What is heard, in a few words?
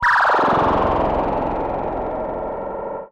Classic FX; radiosonics; Classic Simple OSC FX